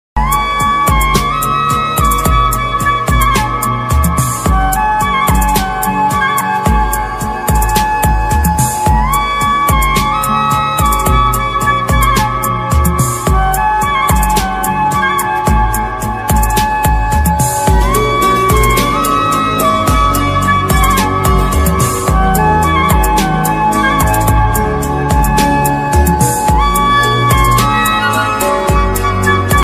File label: movie bgm ringtone